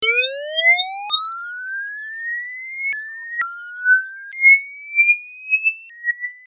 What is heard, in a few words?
alien siren